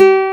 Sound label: SPAN G2, NYLON G; AKAI S-Series CD-ROM Sound Library VOL-1